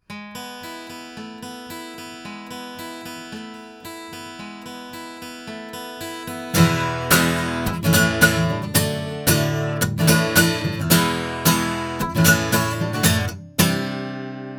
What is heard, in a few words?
ADK A51 Model S guitar